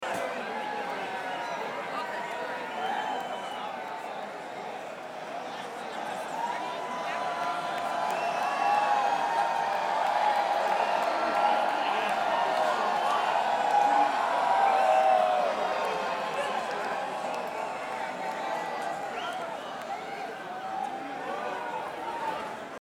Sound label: Crowd